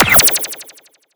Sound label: Magic Item Pickup